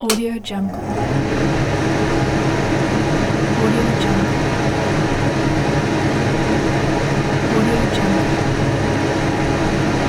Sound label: exhaust fan